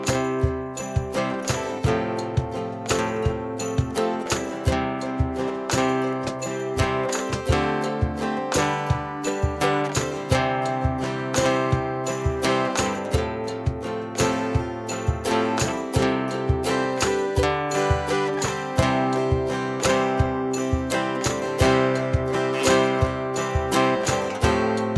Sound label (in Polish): Wiosna Czarodziejka INSTR, WERSJA INSTRUMENTALNA